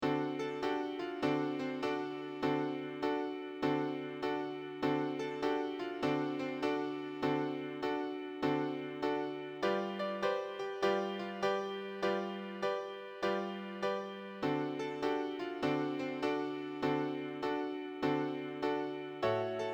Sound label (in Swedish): Blues melodiskrivande exempel